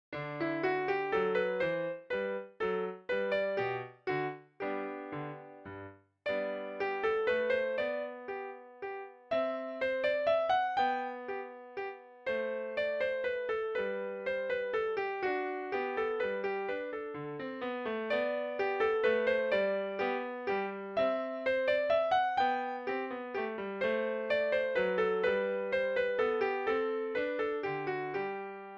Minuet

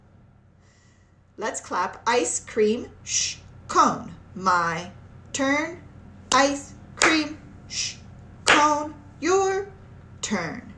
Clap

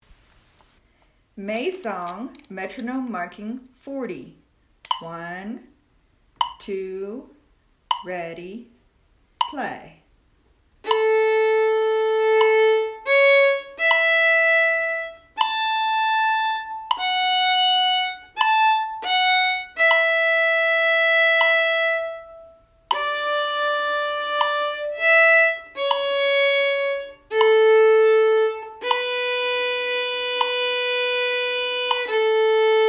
Maysong met 40

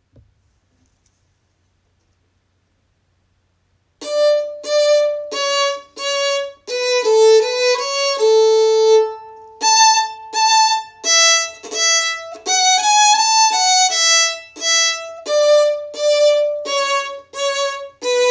Allegro Melody

